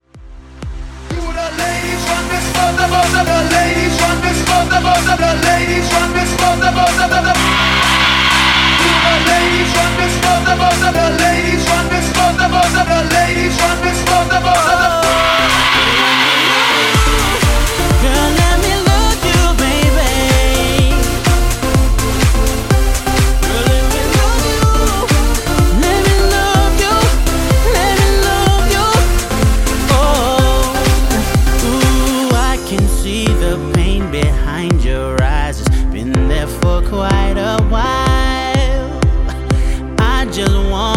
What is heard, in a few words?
Clap Intro Hype 125